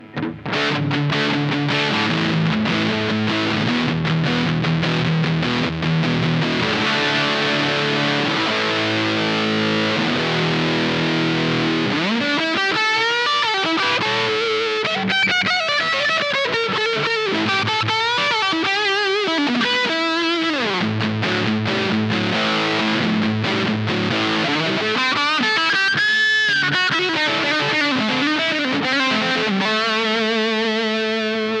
Higain Preamp; preamplis en rack; Preamp 3

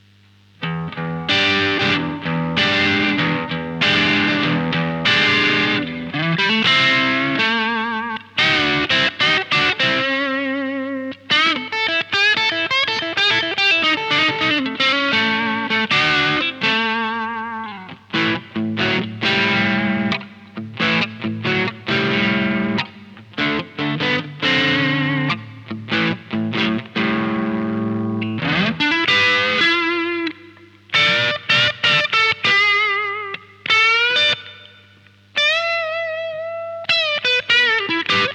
Crunch Preamp; preamplis en rack

